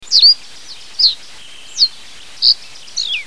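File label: reed bunting; oojurin; toritori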